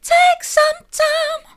take some time soft, vocal

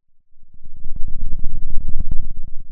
pop, Sound of a thunderstorm

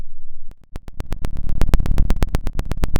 Sound of a fire crackling

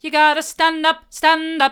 you've gotta stand up hard, vocal

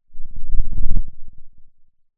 pop

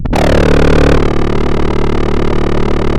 pop, chainsaw

